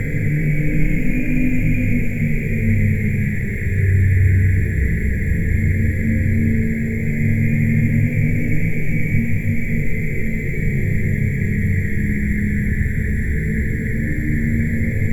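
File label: Ambience Sci-fi Energy Loop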